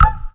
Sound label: rope; inlogic-arcticpong